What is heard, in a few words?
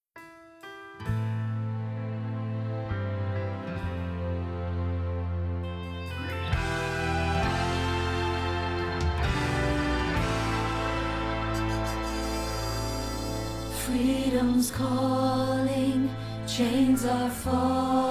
Soprano, gospel choir